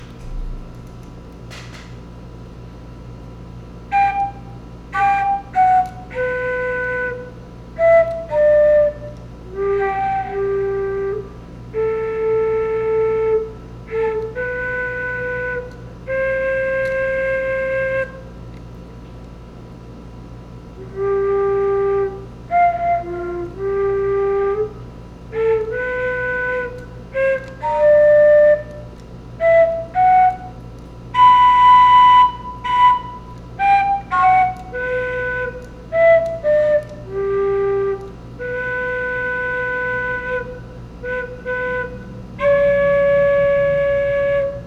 le cygne le carnaval des animaux flute beginner mouthpiece; camille saint saens